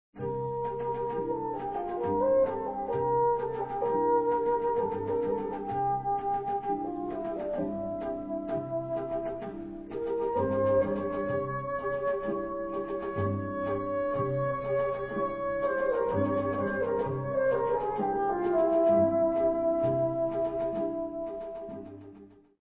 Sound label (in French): Theme B joue au basson; Bolero Ravel